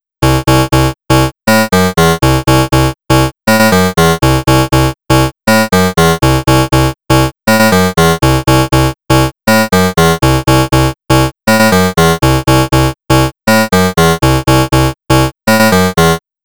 programmable wavetable

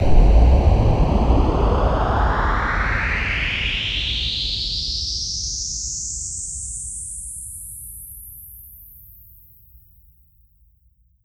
lifting

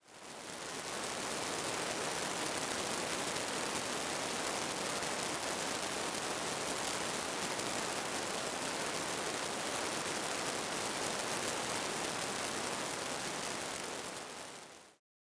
monsoon

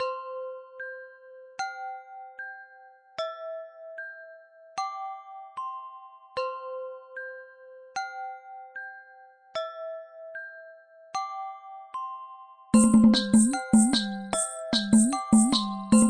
bells

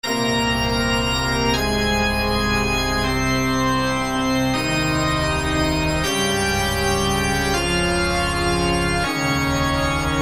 organ cathedral; ff8 organux

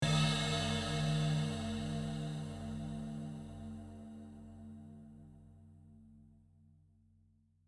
swirl processed long cymb

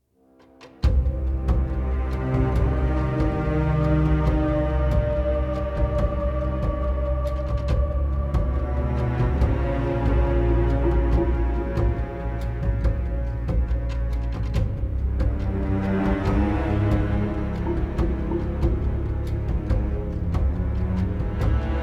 percussive piano snippet

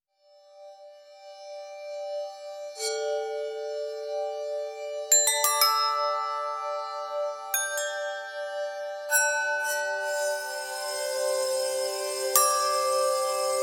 crystal articulation

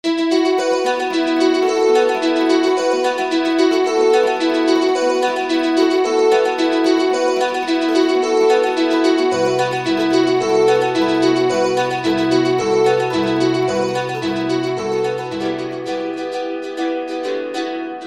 electric mandolin

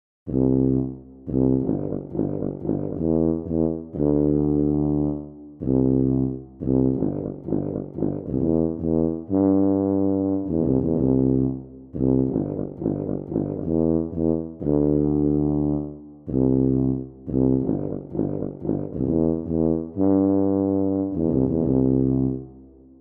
sea monsters brass tuba

